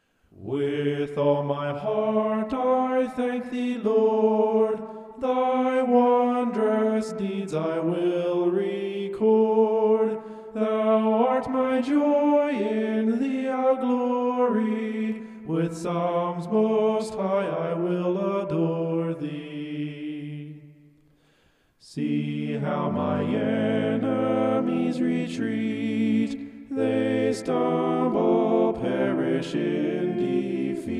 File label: vocal homoph; psalm 9